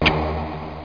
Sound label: Death Hit; Blix